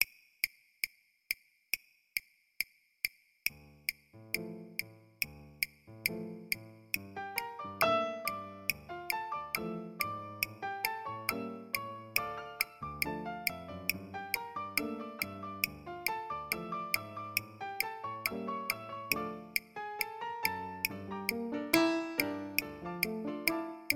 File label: Two Guitars